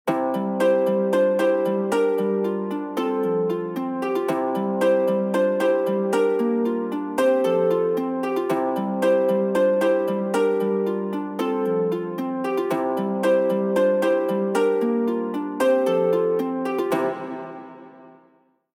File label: ios7 uplift, ringtones